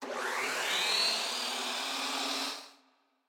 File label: Charge drill; super mombo quest